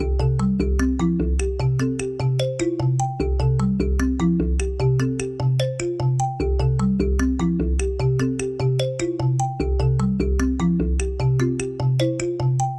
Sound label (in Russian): muzyka dlya igr